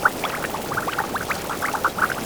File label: lava cauldron loop; emitters global